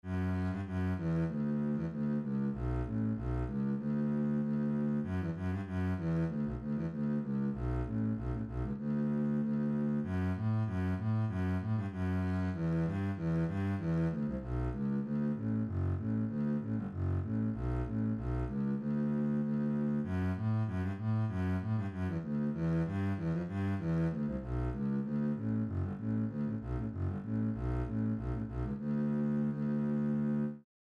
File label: bass, string bass; Kemp